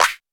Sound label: U220 FX Set, Drum Machines; Roland U220